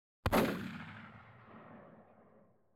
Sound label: shotgun Blast